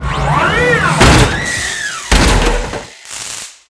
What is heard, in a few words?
Die